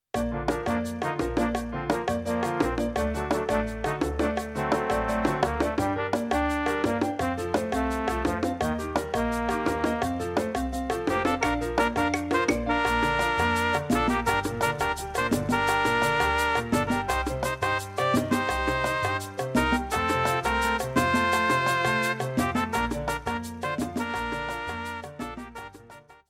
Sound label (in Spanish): Salsa